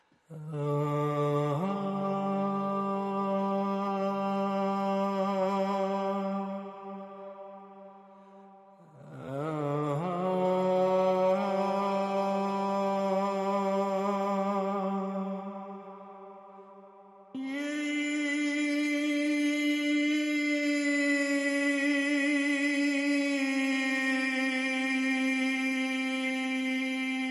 Vox

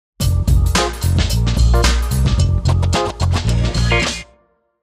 Beep Bop

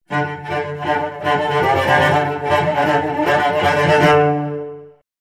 Cello Danger Excitement

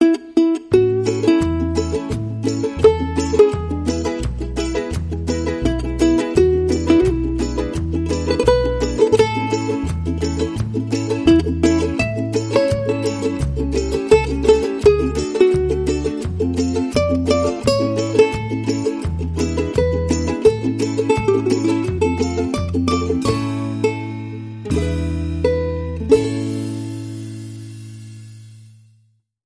Ukulele Happy Birthday

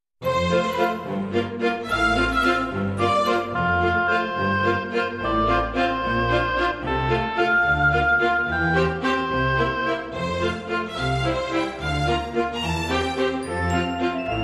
Grand Waltz

